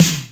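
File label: Drumulator snare; Hollywood Edge; The Big Bad Beatmachine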